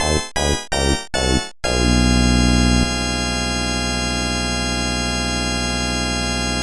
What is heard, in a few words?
Down Beat; Example Modules